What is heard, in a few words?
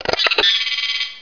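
door switch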